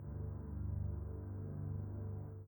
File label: chord